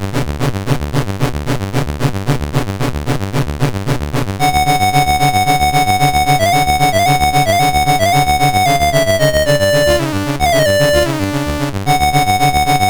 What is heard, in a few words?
sabre dance, mus MOS6581R4